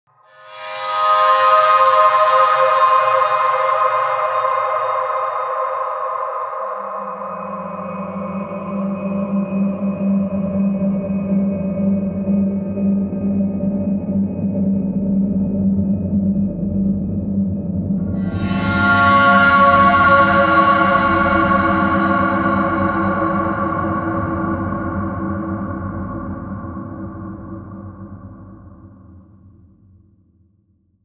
environment stinger; aragami

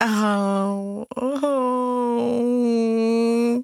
moan

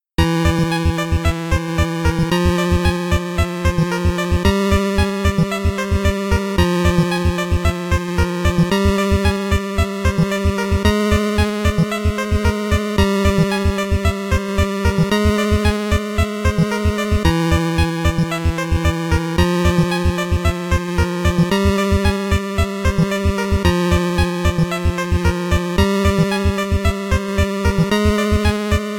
BGM LAST BOSS INTRO 8bit; LASTBOSSDEMO; Mighty No. 9; 8-bit soundtrack

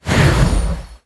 Gust Small Positive; God Awe-full Clicker

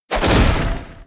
Tackle

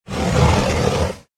Drawer

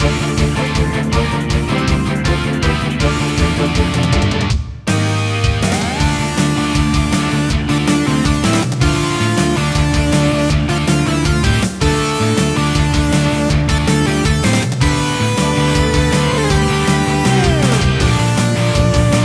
BGM Dungeon Normal Boss; Puzzle & Dragons Gold

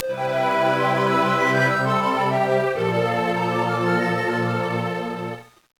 Unknown Jingle; Golden Sun: The Lost Age

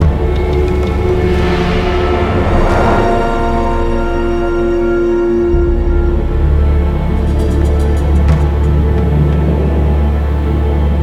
Siege Field Melody; Ninjago Monastery; lego universe macos windows gamerip 2010

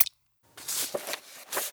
docs choose

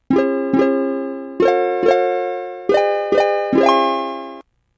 Harp (fifteen notes); Harvest Moon: Magical Melody

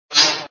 enemy contact; Bubble Tanks 2

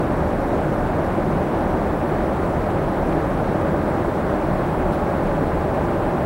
Jetpack

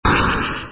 Player die Snd; Robot Wants Kitty

